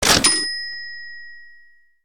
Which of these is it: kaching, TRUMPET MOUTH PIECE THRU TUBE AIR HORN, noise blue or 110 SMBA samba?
kaching